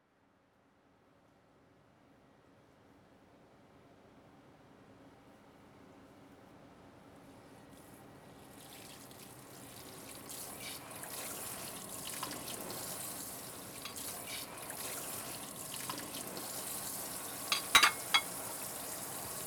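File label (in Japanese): 顔と首のマッサージ（吐息）, ASMR; 顔と首の水星マッサージ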